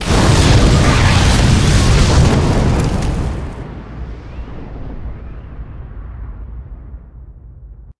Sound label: explosion large